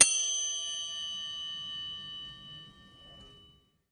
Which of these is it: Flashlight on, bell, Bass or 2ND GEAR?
bell